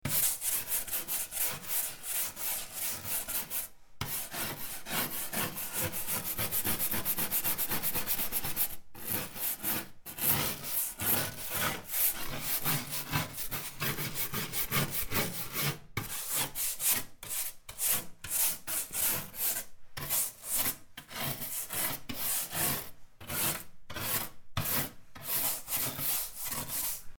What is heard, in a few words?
sponge cleaning